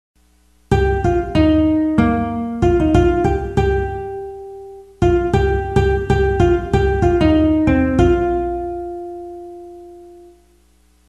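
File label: tone nylon guitar solo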